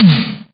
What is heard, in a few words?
Tom, Percussion; Vengeance Samples